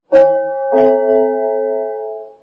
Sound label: gong